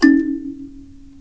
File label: SANSA CHORD, KALIMBAS; Spectrasonics; Heart of Africa